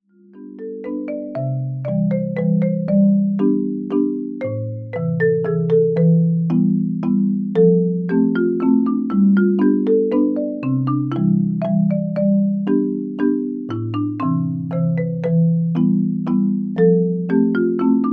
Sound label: Little Waltz